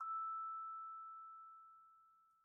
Vibes soft E5, Vibraphone, Soft Mallets; Struck Idiophones

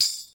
tamb, Tambourine

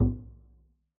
pizz noise slap resp, double bass; dsmolken

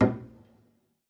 pizz noise mute, double bass; dsmolken; noises

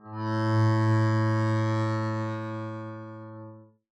arco a2 mf up, double bass; dsmolken

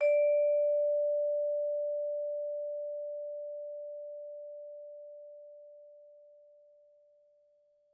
Vibes hard D4, Vibraphone, Hard Mallets; Struck Idiophones

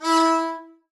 arco e4 ff down, double bass; dsmolken

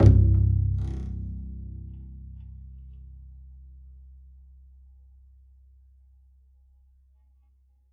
pizz c1, double bass; dsmolken

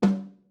snare no snare f; Membranophones; Struck Membranophones; Legacy Snares

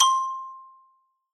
Xylo Hard C5 ff far, Hard Mallets; Idiophones; Struck Idiophones; Xylophone

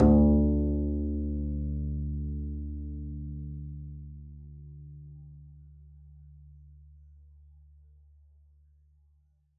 pizz d2, double bass; dsmolken

